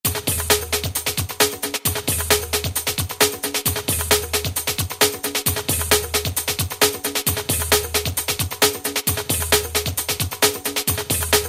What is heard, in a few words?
breaker 133; Best Service Alpha Dance II